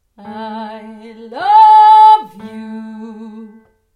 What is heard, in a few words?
I love you octava; Sloth